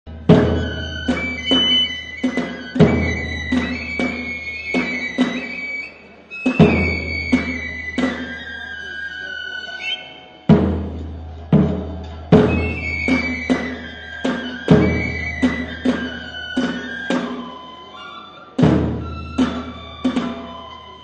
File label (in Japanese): 打ち囃子, 山車の曳行時に演奏される; 尾張の山車囃子; 半田市; 亀崎; 田中組「神楽車」